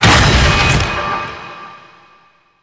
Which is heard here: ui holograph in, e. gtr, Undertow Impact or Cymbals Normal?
Undertow Impact